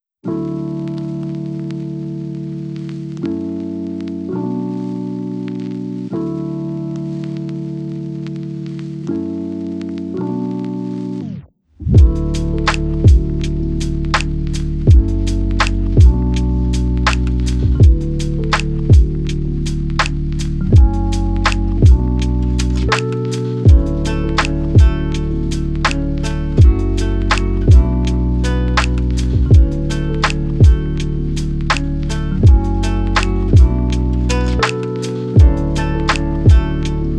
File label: lofi hip hop